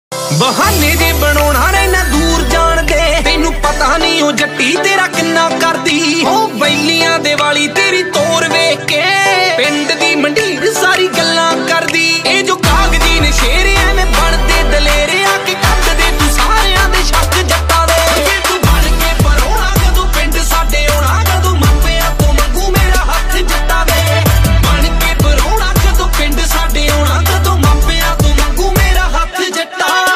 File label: DJ Punjabi Ringtone